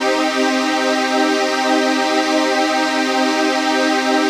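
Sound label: chord pad, Pads; Best Service; XX Large - Pads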